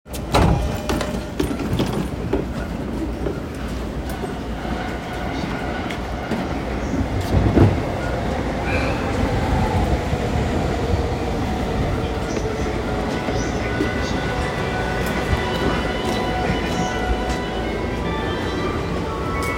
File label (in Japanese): JR東海道線大船南